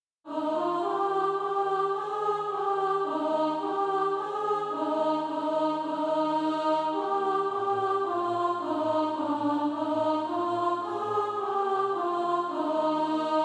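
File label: alto, Practice Music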